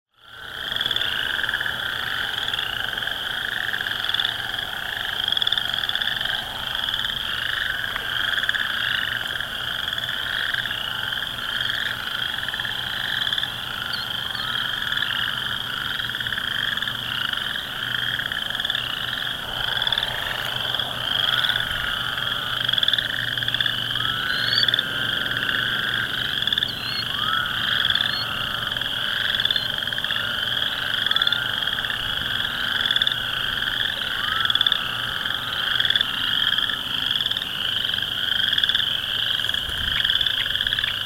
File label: frogs